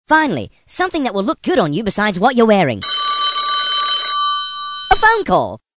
finally a phonecall

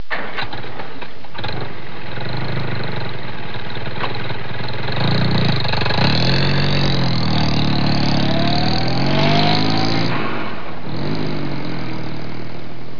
trucks

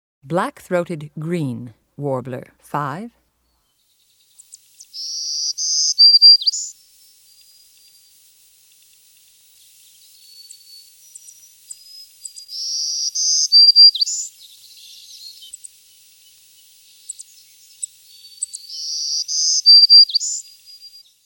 blackthroated green warbler

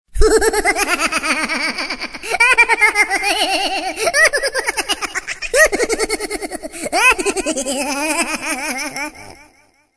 crazy laugh

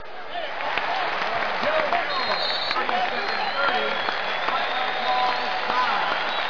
background

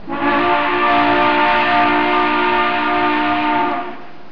nautical

